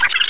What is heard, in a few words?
rat; Undercroft; enemy